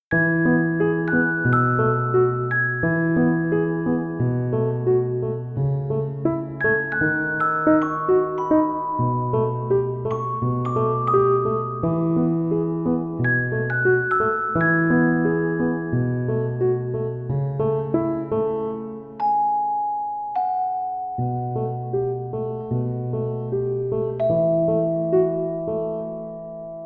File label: Magic Box; Féerique